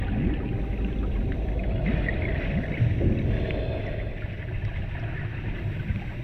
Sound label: ambience underwater; We Were Here Forever